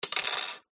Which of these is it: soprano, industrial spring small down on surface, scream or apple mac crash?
industrial spring small down on surface